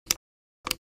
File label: Mouse drag and drop click